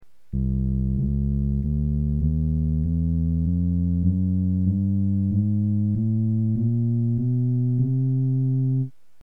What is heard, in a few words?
Bass, Gibson combo organ